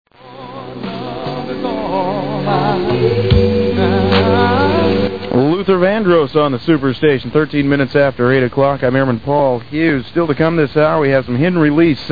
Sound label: AFKN 88.5 Osan